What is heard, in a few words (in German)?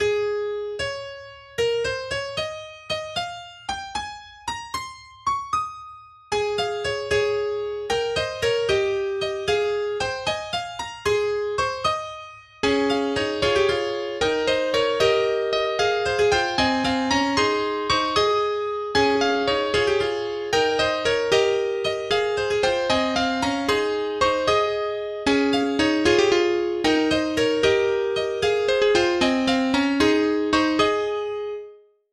Kanon As Dur